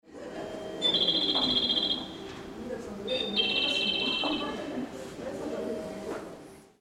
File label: station